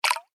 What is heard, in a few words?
water pour shallow, Water Poured Into Shallow Water; Pouring; Objects; McKinney Sound